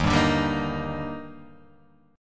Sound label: Eb Major 7th; EbM7; strummed; JGuitar